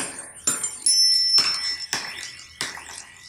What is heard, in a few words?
Wooden Bells, Ethnic Instruments; Sonic Foundry (Sony Creative Software); The Electronic Music Manuscript Collection